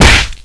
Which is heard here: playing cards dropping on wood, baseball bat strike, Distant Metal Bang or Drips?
baseball bat strike